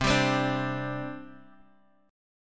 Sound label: C Suspended 2nd C Guitar Open D; down; chord shape